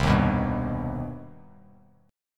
C Minor Major 7th C down Bass Standard; strummed; JGuitar

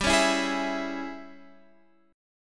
Ab Major 7th Suspended 4th Sharp 5th G

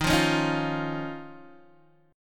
Eb 7th Suspended 2nd Eb, Guitar DADGAD; strummed; x,6,3,6,6,3

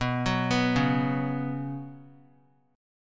B Suspended 2nd, chord; arpeggiated; JGuitar

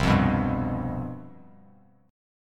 C Major 7th Suspended 4th, down, Bass Standard; strummed

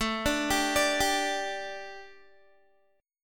A 7th Suspended 4th, A, down, Guitar

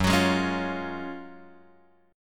F sharp Major 7th Suspended 2nd; F#M7sus2 Chord {2 X 4 1 2 1} strummed

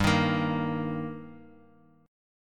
G Minor Sharp 5th G, Guitar, Open F; strummed; x,2,3,5,x,x; JGuitar

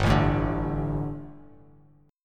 G Suspended 2nd G, down, Bass Standard; Gsus2 strummed; JGuitar

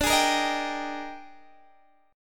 A sharp 13th

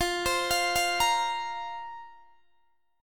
F Suspended 4th F, Guitar DADGAD

